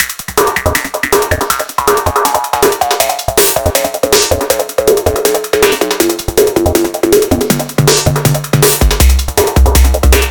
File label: Groove 160bpm Filt Long